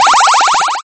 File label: Powerup sound